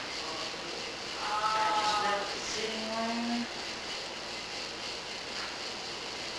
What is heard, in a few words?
Yell; Allen House